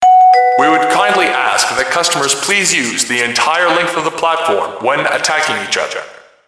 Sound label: subway